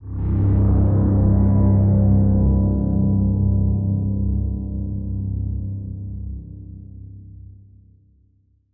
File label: Danger Flourish; Insanely Twisted Shadow Planet